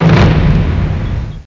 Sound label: Crump; slider ii